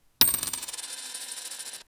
the sound of a coin, currency

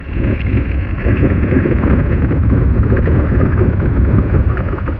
the rumble of the glacier; water

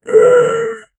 occasional anguished groa

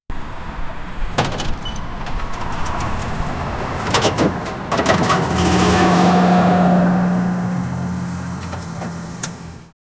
the sound of the opening, bags

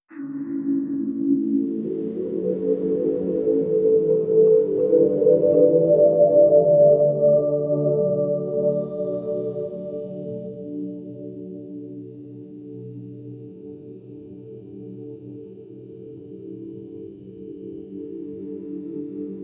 relaxing ambient lofi, lofi

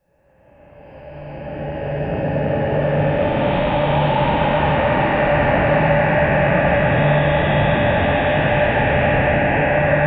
ambient cyberpunk utopia; cyborg

